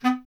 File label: B. Clarinet